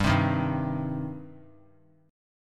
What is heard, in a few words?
G Suspended 4th Sharp 5th G, down; Gsus4#5 strummed; JGuitar